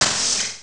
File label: Level In; Future